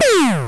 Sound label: shoot